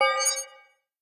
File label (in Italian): Carro pieno di cristalli; BLIND QUEST - The Enchanted Castle